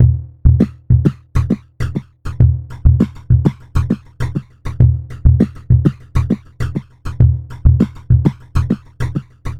Hip Hop

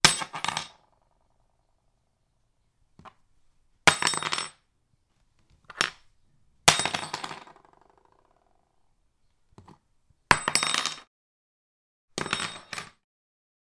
Metal Small Piece Fall Hit Wood Floor

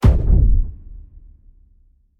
Low Punch Cinematic Impact

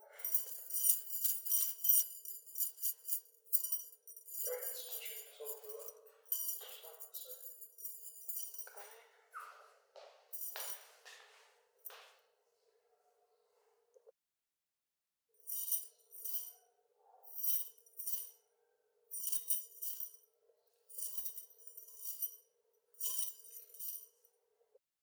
Bangles

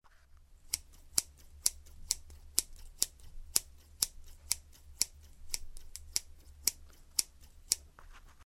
Sound Of Scissors Cutting

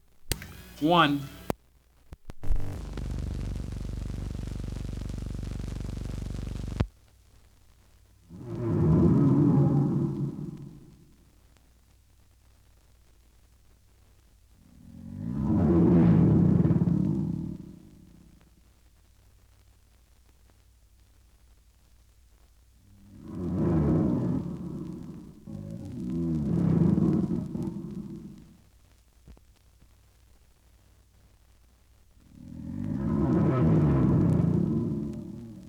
Alligator Growl

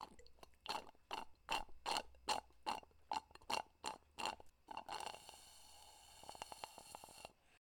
Slurping

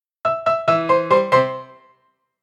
Knocking Piano Logo

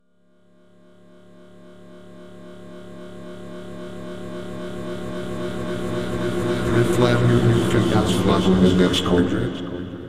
heehee

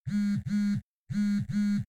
Vibrating Message

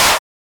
Snare; Hellmouth

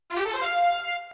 Winn Up

